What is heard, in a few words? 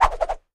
Air Swish Quadruple